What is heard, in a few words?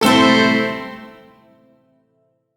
Shawzin Maj Chord; Music; Warframe; Duviri; Chords